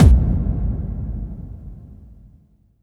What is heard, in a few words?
BD-FX, Dance Mega Drums; Best Service